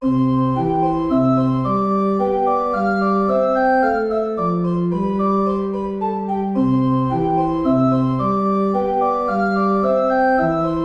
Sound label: tempo minuetto; hook james